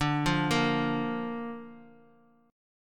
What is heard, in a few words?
D-Minor Sharp 5th, Dm#5 chord; Dm#5 arpeggiated; JGuitar